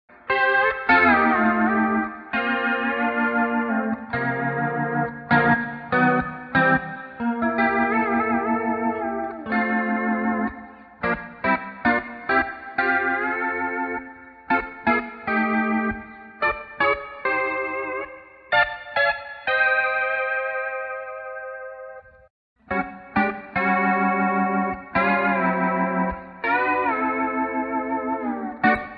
Rotary, ToneX Pedal

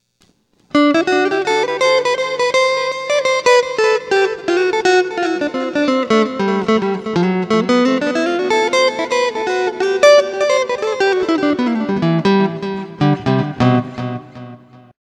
BRIGHT CLEAN, Boss GT-8

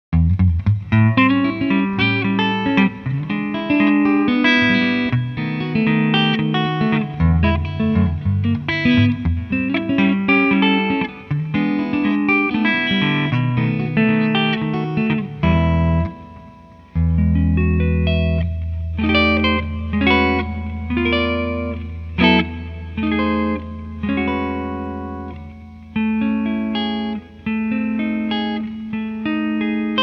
archtop electric; Silkyn Tube Amp

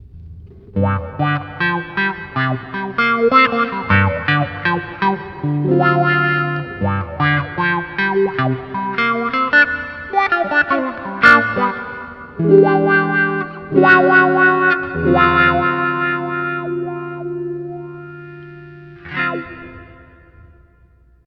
Wah Wah, Boss GT-8

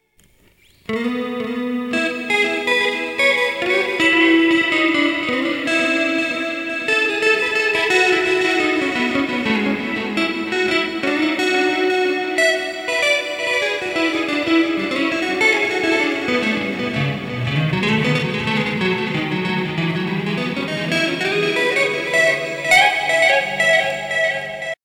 PAD, Boss GT-8

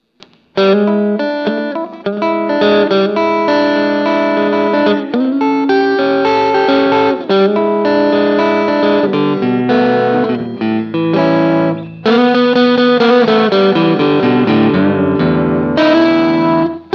TWIN CRUNCH, Boss GT-8

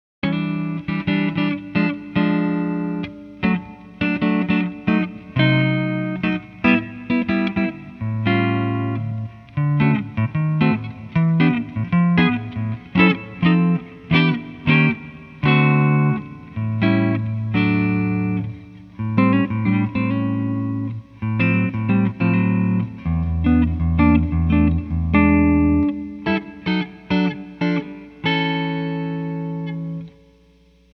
archtop electric; Silkyn Tube Amp

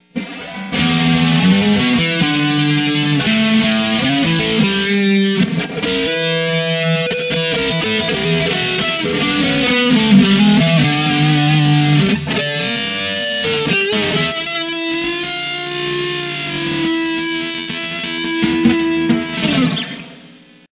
MASSIVE, Boss GT-8

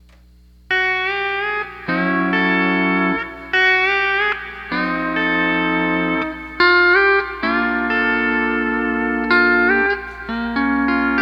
Night Life E9th

